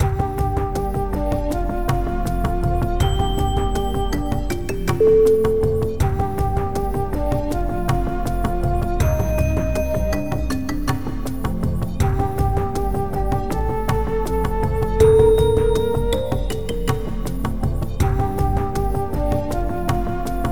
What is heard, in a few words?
the turning wheel